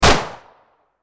Gunshot

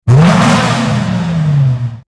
car engine rev

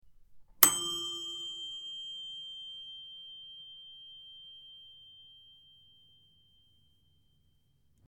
Oven timer ding

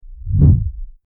swoosh

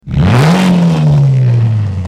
car engine revving

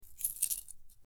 Keys jingle

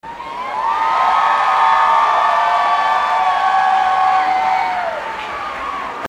Crowd ambience concert

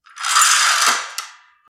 Blinds closing

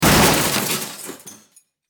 car crash

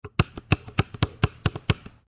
Basketball dribble

